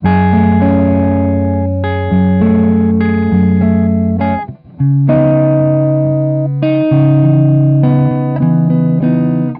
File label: Xmass Guitar Loops 100bpm C#min; Guitars; Ghosthack; Advent Calendar 2019